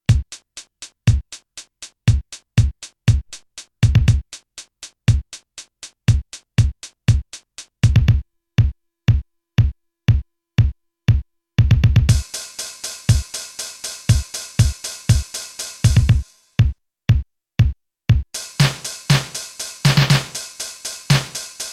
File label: key drums